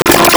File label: vs all waves